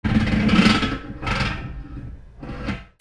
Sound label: FRICTION